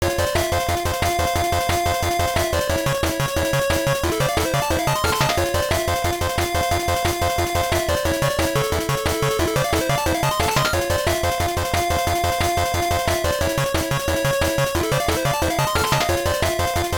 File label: Windy Danger